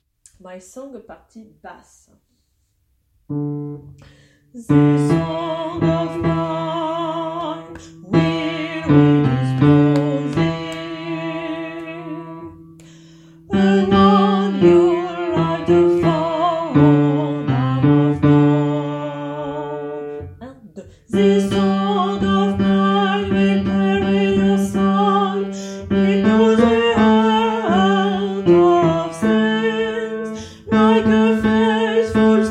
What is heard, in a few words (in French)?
partie basse